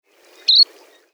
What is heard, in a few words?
Least Sandpiper Breet upon taking flight